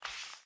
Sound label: Paper Move; MiSide